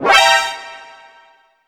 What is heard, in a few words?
alert once; stealth; w00tguy